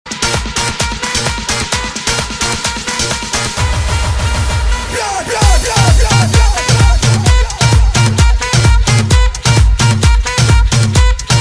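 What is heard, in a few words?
instrumental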